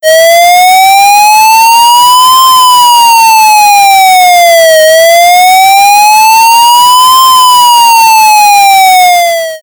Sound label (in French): sirene; OetziAlarm